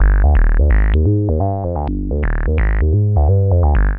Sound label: BASSRUN 128, BASSLINES